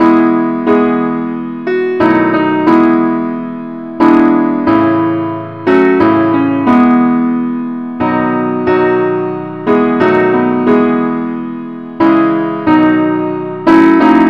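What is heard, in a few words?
simple accompaniment key e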